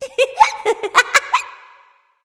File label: laugh; smite; voicepack; scylla